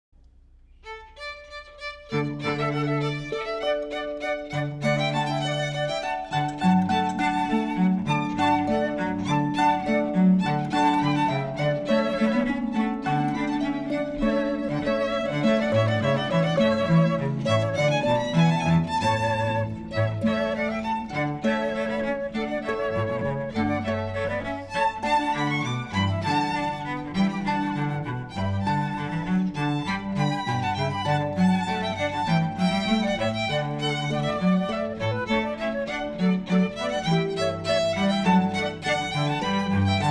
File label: Harp Flute Violin Cello; The Rejoicing